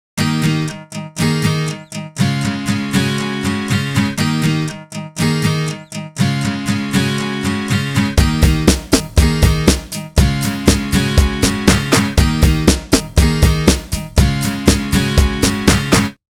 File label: guitar, ringtones; OnePlus; 7T